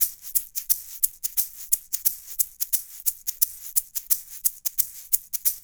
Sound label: LOOP, SHAKERS